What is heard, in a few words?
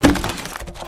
crate break